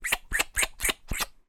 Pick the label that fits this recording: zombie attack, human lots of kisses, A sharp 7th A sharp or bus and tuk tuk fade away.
human lots of kisses